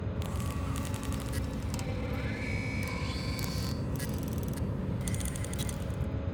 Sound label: lab amb